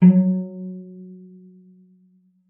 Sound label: cello pizz F#3; 90 s Sample CDs; Xsample VOL-5